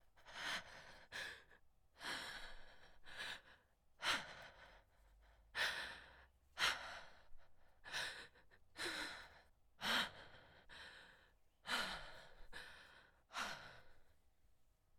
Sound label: Woman Heavy Breathing